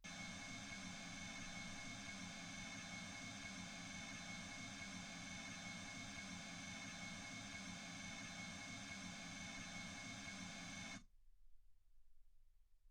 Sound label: mls 48kHz